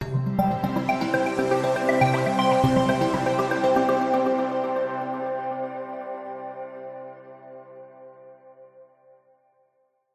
multimedia logo musical arpeggio synth warm trusted science; zapsplat